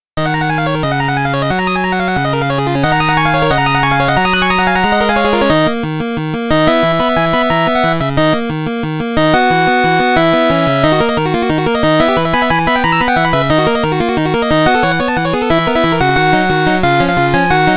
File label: 8bit